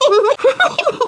Sound giggle

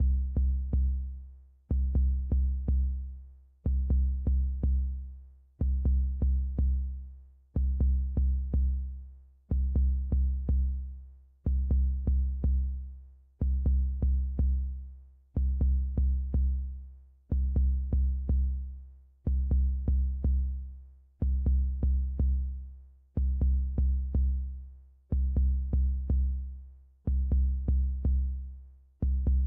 BASS

